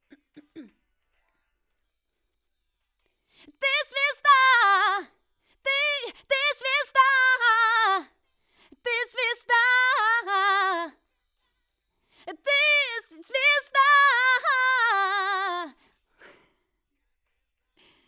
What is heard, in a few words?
Soul